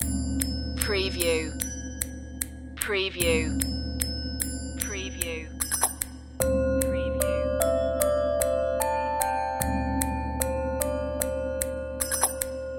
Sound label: loop; world influences the orient